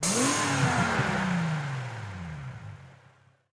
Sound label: Nitro; MiSide